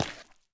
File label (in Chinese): 树脂踩踏声